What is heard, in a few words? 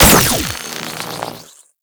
Fire; Warframe; Captain Vor; Nervo